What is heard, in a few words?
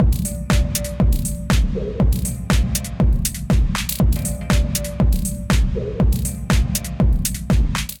Kit, Drums; Sunday Morning; Ableton; Factory Packs; Punch and Tilt